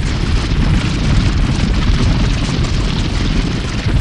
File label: Giant Centipede Move Loop